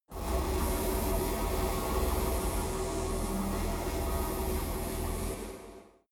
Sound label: space buzzing energy plant; energie